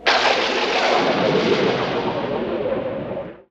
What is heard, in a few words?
Cartoon Thunder; musicradar; cartoon caper